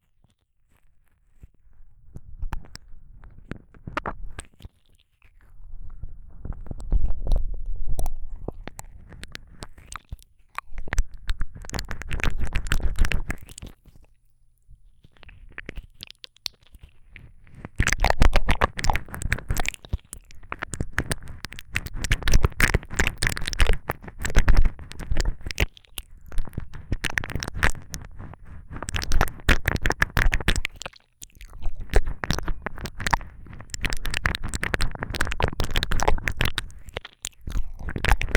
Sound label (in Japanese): 奥まで舌を入れてくちゅくちゅ耳舐め